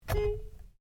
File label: Horn Short Interior, car; Hyundai Accent 2008; Hyundai hire car; Vehicles